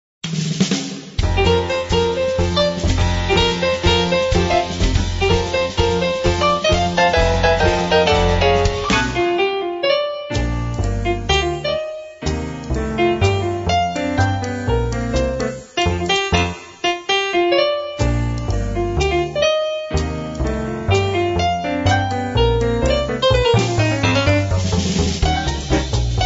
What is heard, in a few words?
happy bg, baccarat